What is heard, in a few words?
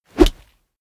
whoosh fleshy hit; swooshes-swishes